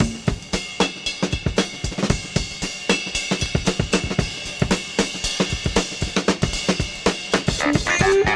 115 bpm hq drum loop sample a sharp key, Free breakbeat - kick tuned to the A# note